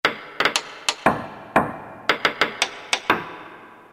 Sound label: Taiko Rim; Roland MT-32